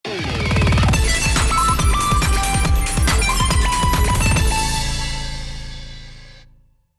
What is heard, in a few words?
Jingle Boss End; Gun Gun Pixies; PS Vita; Nepu